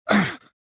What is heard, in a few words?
pain; jedi